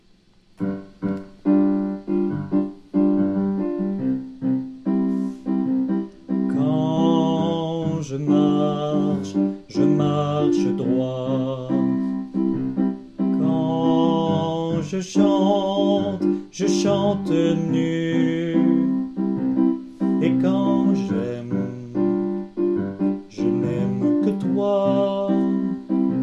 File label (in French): alto